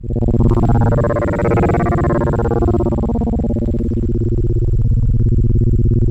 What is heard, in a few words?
A#, Synclav FM; Big Fish Audio; SPACE1